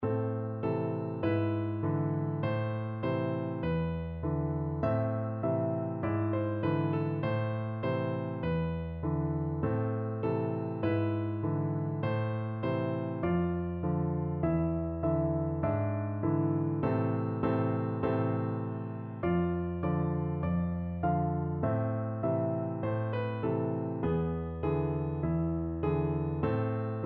Sivivon, piano duet

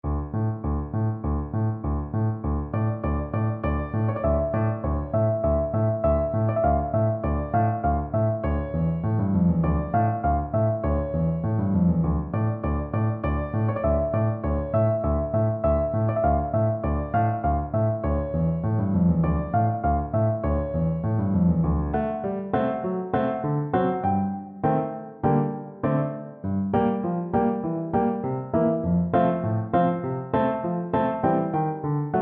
danses des cygnets, Bassoon

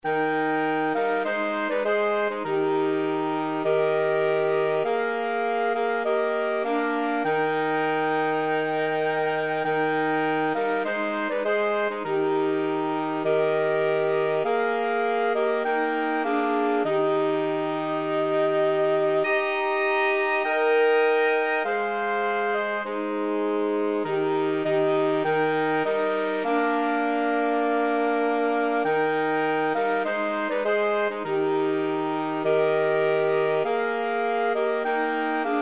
pass me not SAX Q

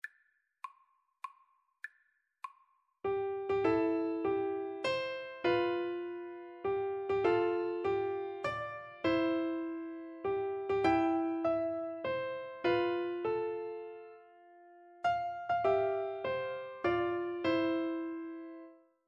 happy birthday, Piano Duet

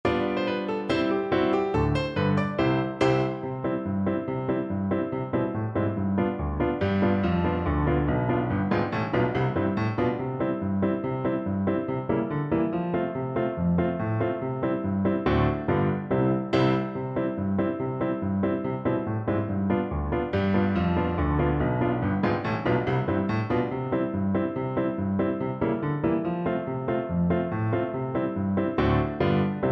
FL kar, Flute

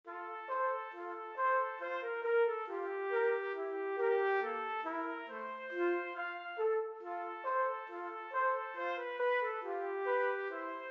trumpet trio

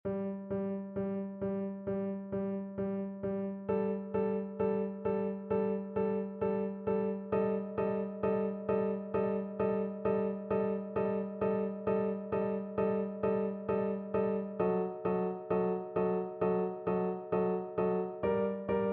winter, Trumpet

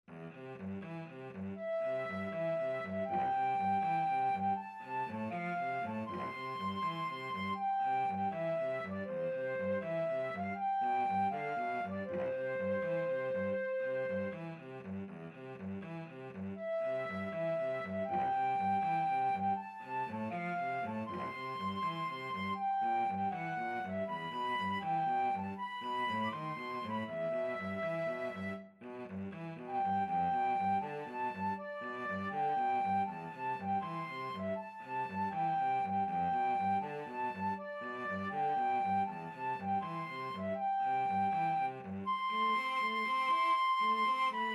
flute cello duet

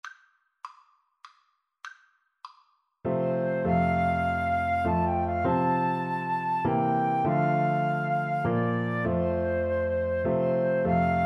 amazing grace, Flute-Clarinet Duet

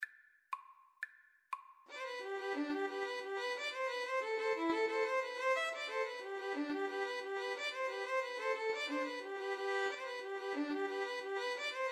irish jig medley, 2 Violins Cello

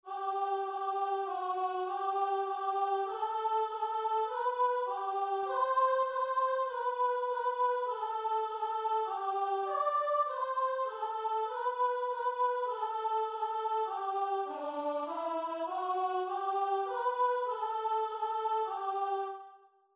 canon GV, guitar and vocal; tallis

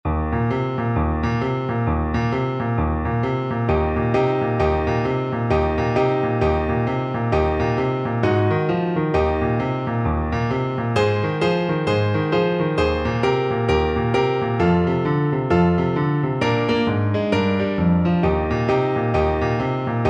jingle bells boogie, Baritone Horn

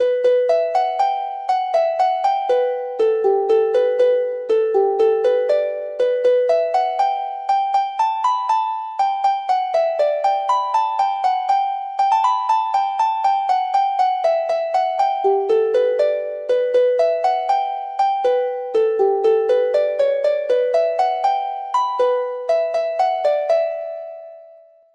harp

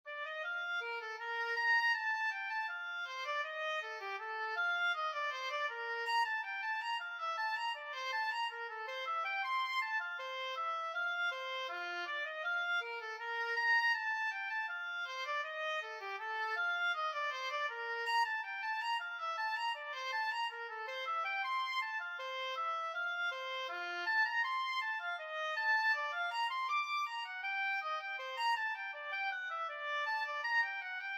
bach bourree, oboe

